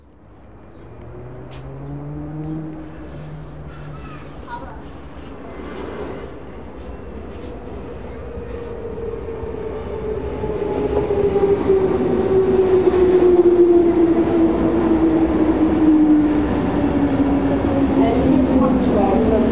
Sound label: train station start stop people; best service Blue Box